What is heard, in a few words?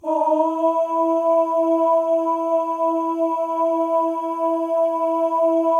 male ohs; Spectrasonics; Symphony of Voices